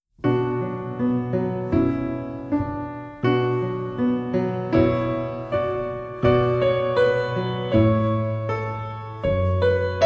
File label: Chanson Belle Accompaniment; Chanson Belle piano only track (test); 6th Grade - Beginner Orchestra